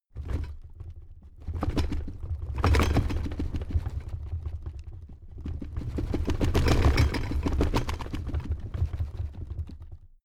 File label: drawer cabinet crammed rattle